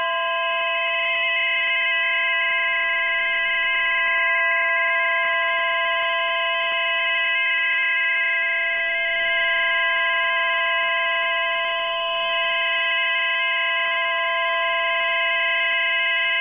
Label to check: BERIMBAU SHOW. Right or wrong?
Wrong